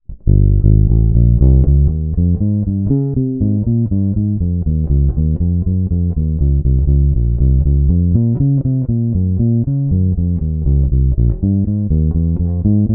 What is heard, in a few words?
Jazz, JBass Linear